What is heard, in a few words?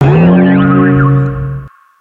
Bouncers